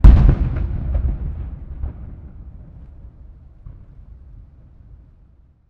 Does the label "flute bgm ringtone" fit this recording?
No